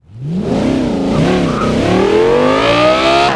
first, vehicles; gmod; tdmcars; r8 plus

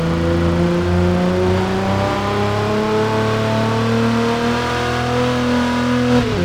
third, vehicles; chevrolet impala

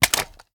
reload empty magout, weapons; xmag; gmod; viper

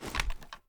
reload empty raise; gmod

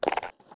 general up down movement, user interface; gmod; mw

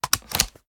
sm uzulu reload mag out; gmod; iw8

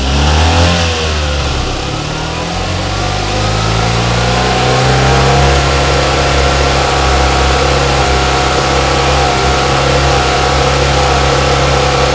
v8 first gear rev loop; commoncar

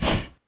gas buff; gmod; zerolib

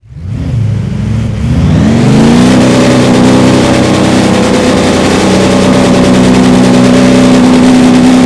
rev, vehicles; f350

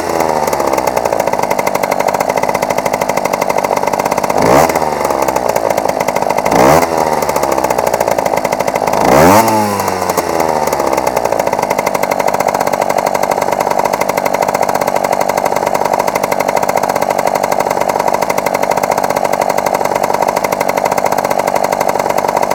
throttle off, vehicles; peel

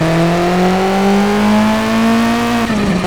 second, vehicles

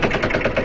grind loop; gmod

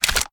reload loop fast load; gmod

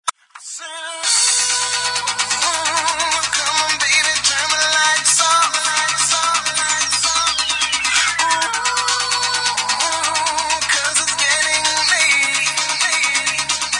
ringtones; gmod; perp2.5

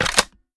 reload empty fast load; gmod

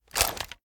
ar raise first start; weapons; gmod; viper

